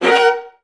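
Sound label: violas F; Best Service; Peter Siedlaczek's Advanced Orchestra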